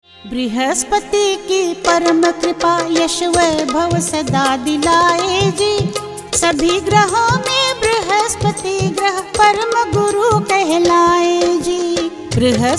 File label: Ringtone